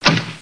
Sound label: bow twng; thief